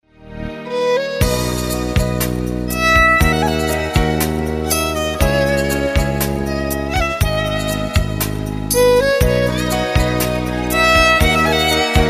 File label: melodious violin ringtone; iphone ringtones